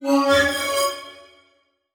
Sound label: Transition Musical Tone Warble